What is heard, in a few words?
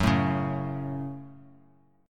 F sharp Minor; strummed; x,9,7,6